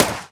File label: shot rifle; Furcherro